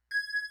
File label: Synth Bells